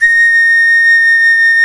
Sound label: PAN A 6E, panflute; SoundBytes - Early Patches